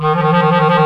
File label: THE CLARINET